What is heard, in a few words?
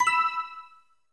Sound effect of Purple Coin